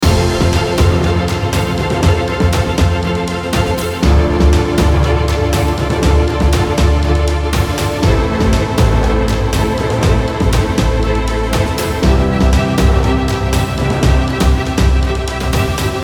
News Loop

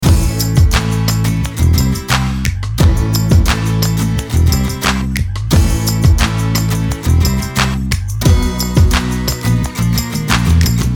Just Hip-Hop Loop

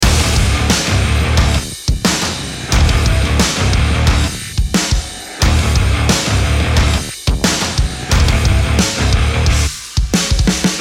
Fight Loop

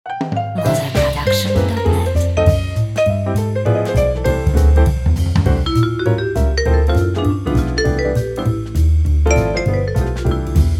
Upbeat Jazz

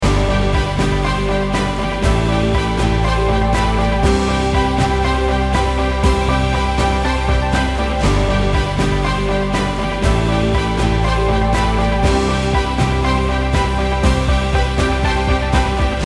Aspiration Loop

